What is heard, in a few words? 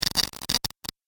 Static Electricity Charge; Miscellaneous Electronic